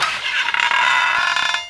Gate; breakout; farm

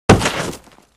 dirt; WMBonusXP

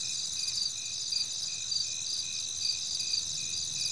AMB; REDNECK; RAMPAGER

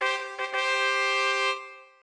trump

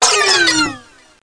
Magic; Total Chaos Nukem 3d Pack; duke

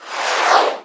SYNTH; tenpin

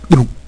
Glunk; System's Twilight

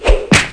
punch; SystemMechanic6; Popup Blocker Sounds

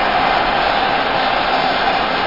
atmos

